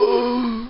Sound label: DYING; BattleSNDS